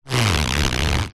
handbag leather strap slide movement hanging chair; zapsplat; foley